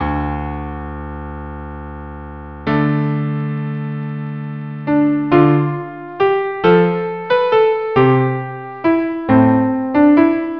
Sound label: violin cello; Greensleeves